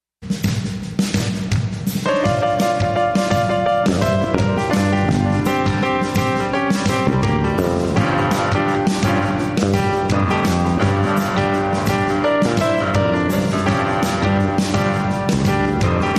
Back Porch Blues

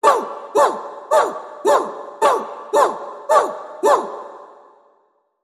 Vocal Fury Female War Calls

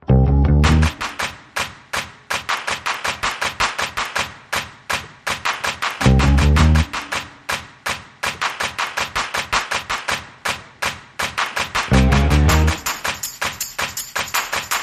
No Horns

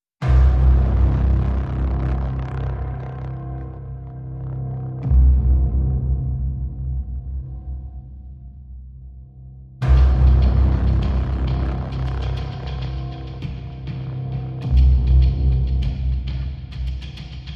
Percussion Only

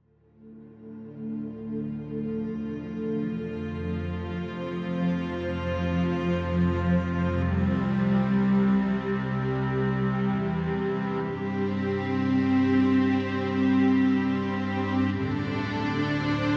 Underscore

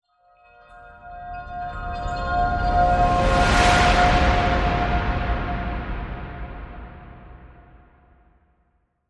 Christmas Chimes Whoosh

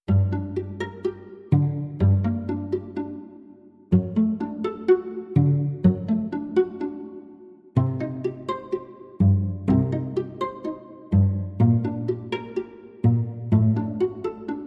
Classical Tango

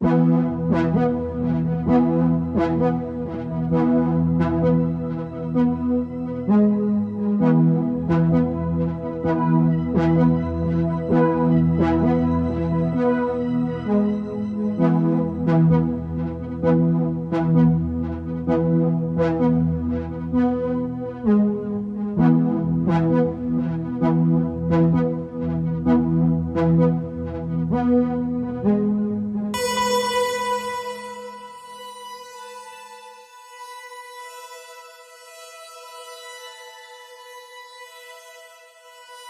Hyproskope, Pad

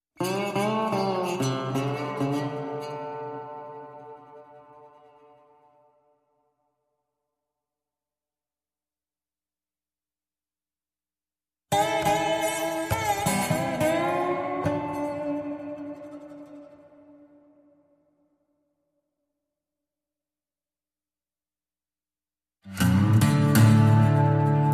Dminor guitar only

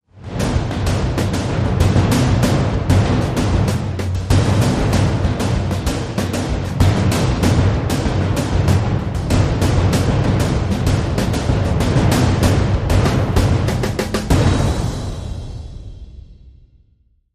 Driving War Drums Sting

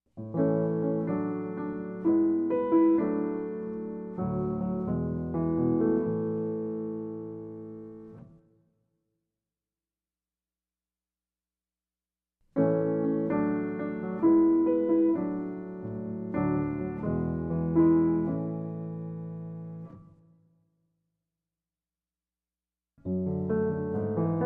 Underscore

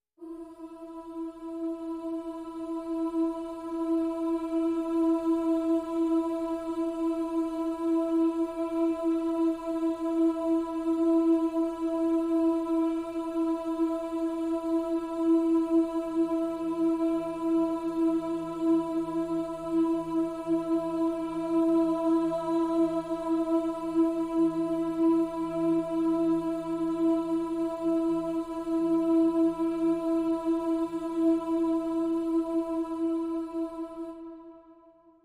Pads Vocalicious (E)

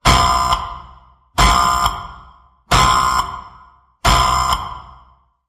Apocalypse Radio Alert

